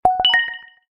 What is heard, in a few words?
Little Notification